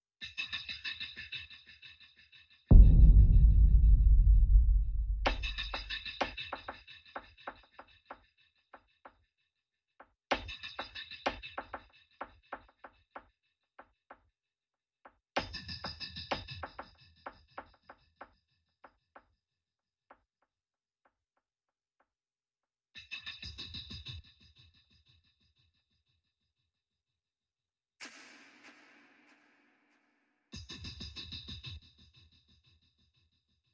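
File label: Percussion Only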